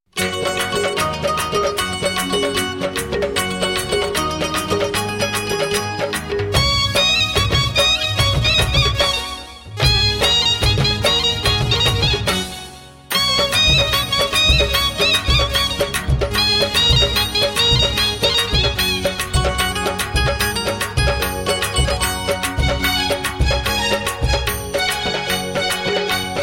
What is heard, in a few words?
oriya tone